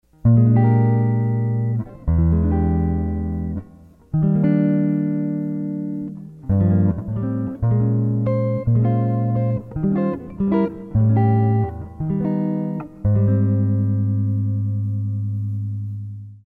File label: full chords